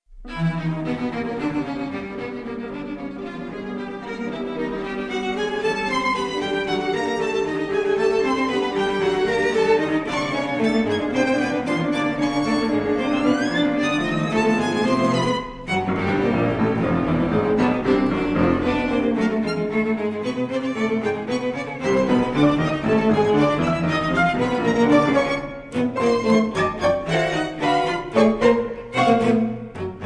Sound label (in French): Quintette